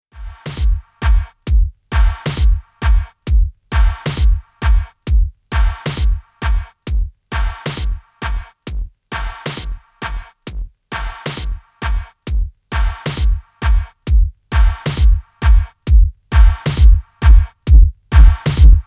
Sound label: Channel Sculpt EQ